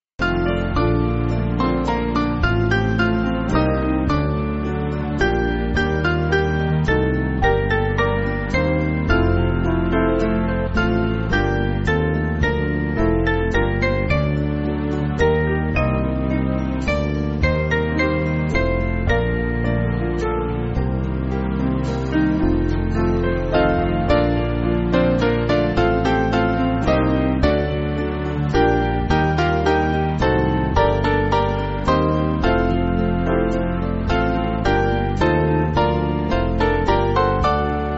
Band, church music; The Sabbath Day; Dexter St

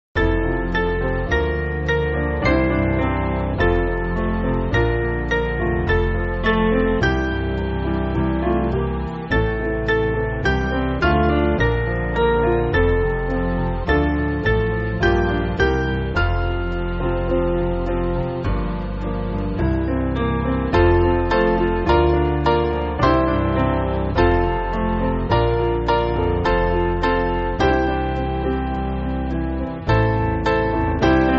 Piano, church music; Jesus Gentlest Saviour; Euxodia

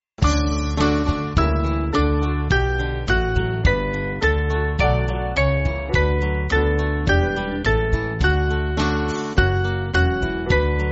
God Whose Citys, Band; Obiit

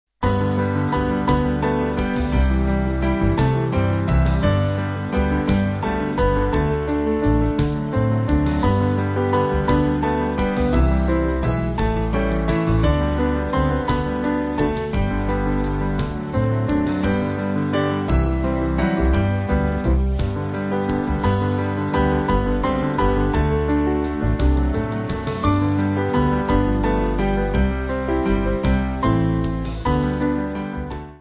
Yesterday Today, Chorus, Band; Small Band; 2/G-Ab

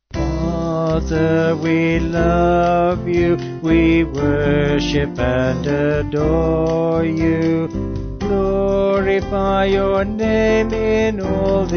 Vocals Band

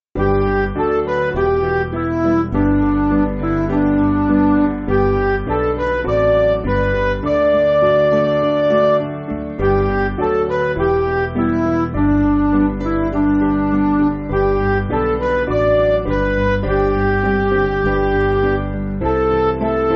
Midi

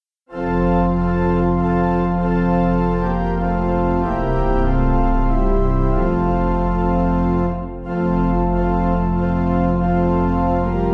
Pipe, Round

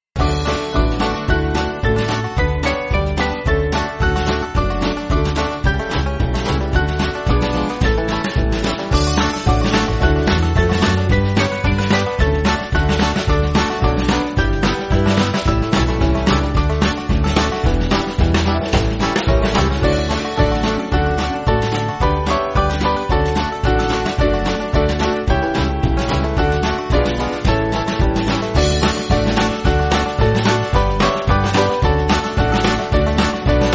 Band, church music; Praise Him Praise Him; Bonner

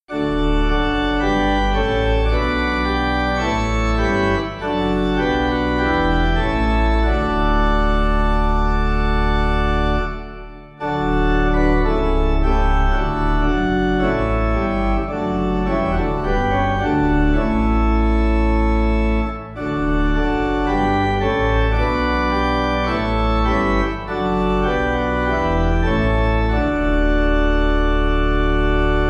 Holy Spirit Hear Us Pastorum Pipe

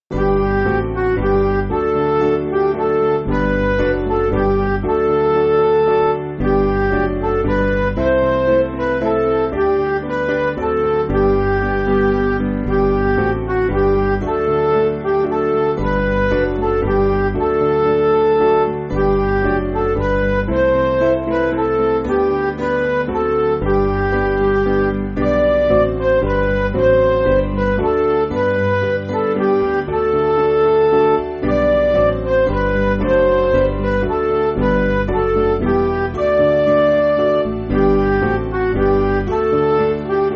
Midi; My Beloved Has Died; Voice Of Praise

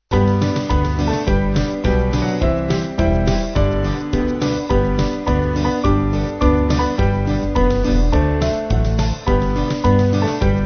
Band; Down At The Cross

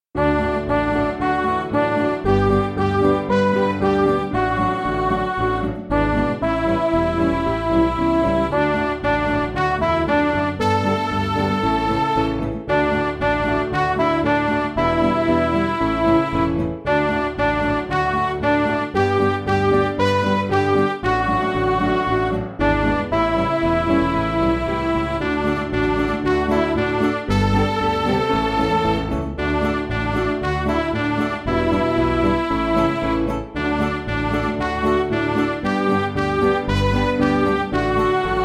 Midi

